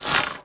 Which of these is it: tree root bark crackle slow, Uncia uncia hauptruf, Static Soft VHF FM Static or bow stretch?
bow stretch